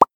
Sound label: pop